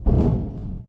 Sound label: duct, footsteps, walk; player; source engine; left 4 dead 2; boomer